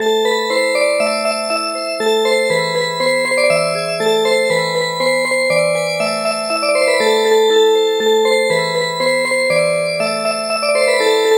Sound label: Music Box, midi traditional